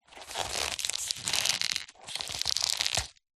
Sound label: foley cardboard squeeze twist creak; zapsplat